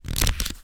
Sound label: paper notepad pages flick through; foley; zapsplat